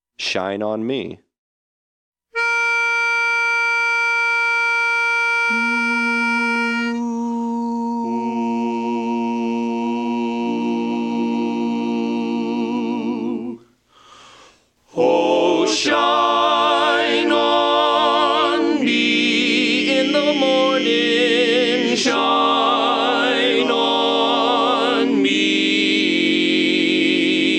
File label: Lead